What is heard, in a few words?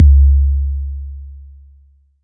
TR808 Kick, Roland SH-32 Drumset